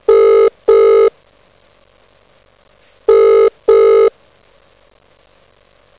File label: Ringing